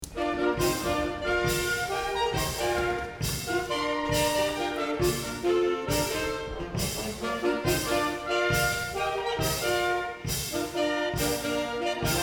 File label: girls fanfare